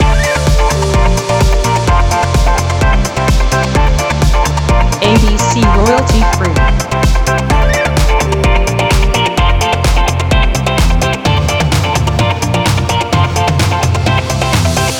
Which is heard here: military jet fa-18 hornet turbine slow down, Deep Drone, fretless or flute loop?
flute loop